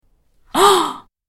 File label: Gasp